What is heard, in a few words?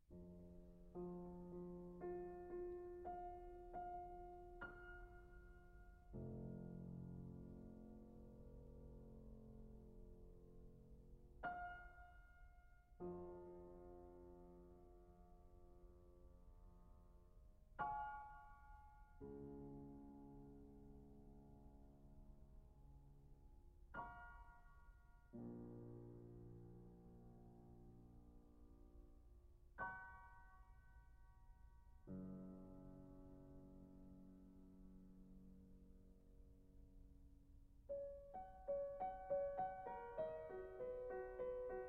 Piano Sonata B-Flat Major - Largo - Allegro Resoluto